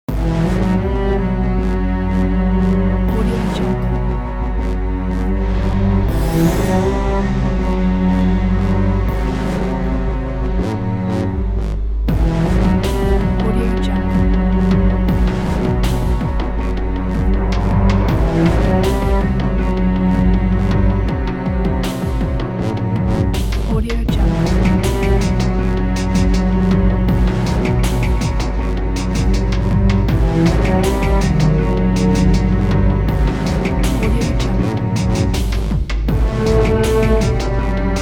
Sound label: mystery horror cinematic eerie